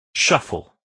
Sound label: shuffle